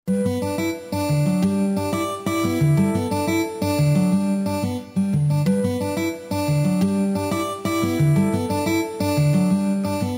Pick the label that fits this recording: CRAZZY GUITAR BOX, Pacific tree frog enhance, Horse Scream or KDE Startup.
CRAZZY GUITAR BOX